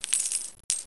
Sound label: coins